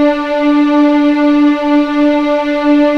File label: D4 LEG P, VIOLINS; East West; The Ultimate String Collection